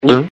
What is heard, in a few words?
Gulp